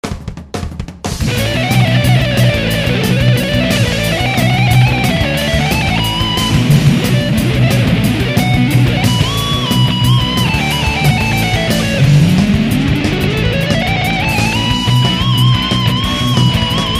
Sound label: soundcheck; meltingpot